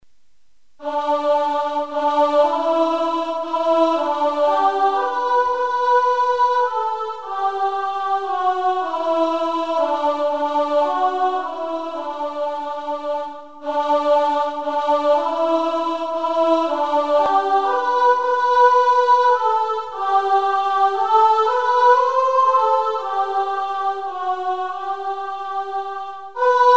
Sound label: Descant Blaenwern